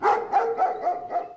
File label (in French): chien